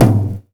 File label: TOM, House Side Of 2 Step